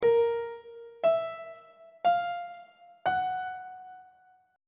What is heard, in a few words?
b flat dim5 perf5 aug5 intervals on treble clef